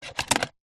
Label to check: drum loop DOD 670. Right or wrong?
Wrong